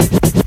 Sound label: Fill Ins 128BPM; Vengeance Samples; VEH2 Loops